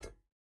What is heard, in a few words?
footstep boots metal jump start; Rust